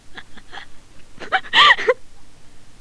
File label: retained laugh